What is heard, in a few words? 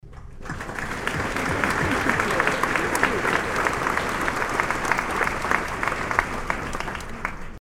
sfx applause